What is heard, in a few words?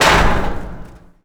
catwalk land